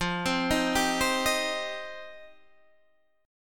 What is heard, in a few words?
F 7th Suspended 2nd F Guitar Open D; 15,15,13,13,15,13